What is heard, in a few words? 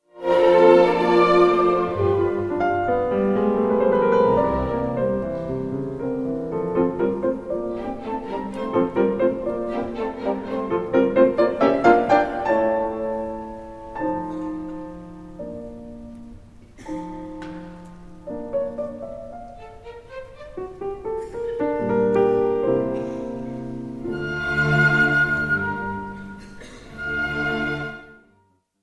piano concerto in a minor; Schumann